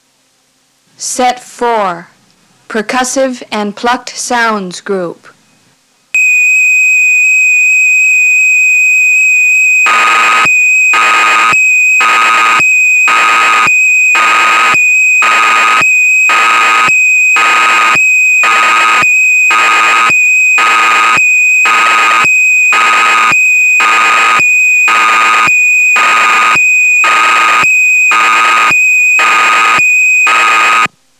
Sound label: Percussive, dx9